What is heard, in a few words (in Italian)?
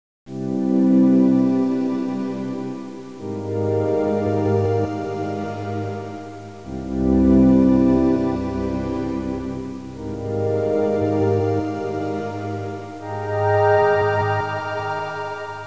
audio suonerie musica mistica